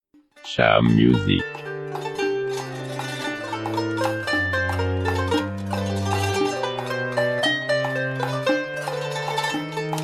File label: Oriental